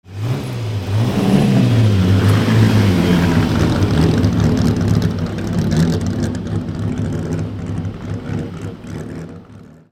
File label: bug rally